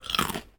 bite

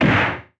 hit

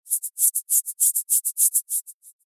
rub

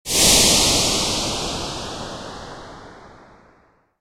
steam

